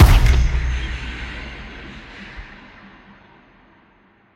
Deep Cavern; Ghosthack; SkyRealm

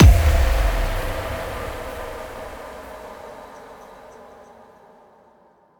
Impact, Dubstep, FX; Impacts; Cymatics; Dubstep Starter Pack

